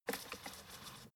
fader plastic stutter soft short

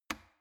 plastic water boiler turn switch on hard

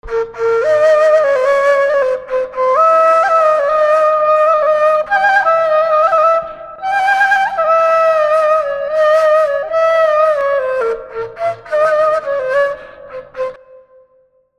oriental flute

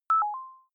notification message ring soft

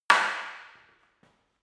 staff wood fighting single hard

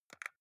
console controller xbox arrow key press fast

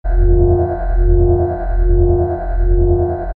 deep metallic modulation

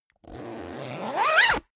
zipper down jacket close accelerate

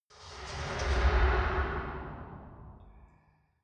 metal soft

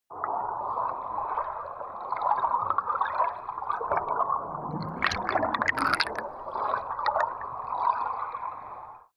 canal

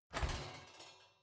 oven open baking sheet ringing hard

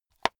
watch case small close hard

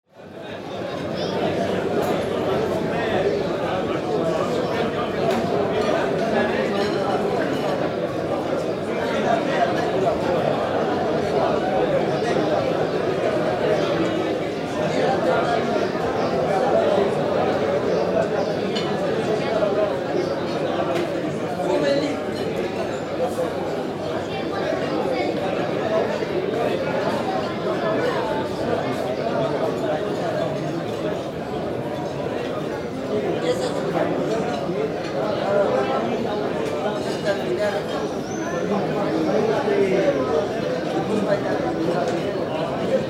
street restaurant

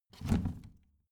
plastic trash can open heavy

